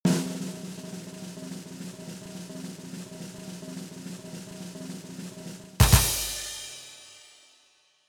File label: percussion